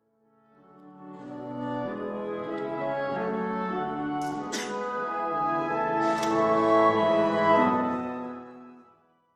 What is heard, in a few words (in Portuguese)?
sopros orquestra de bolso